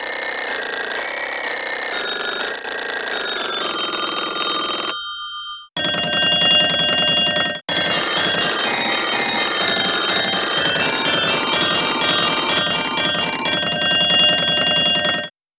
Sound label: telephone